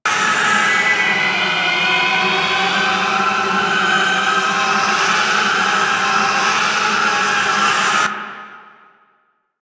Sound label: Rifle Void Loop; Zariman Rifle